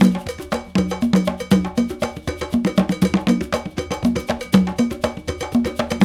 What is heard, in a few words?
Bembe 6/8, World Dance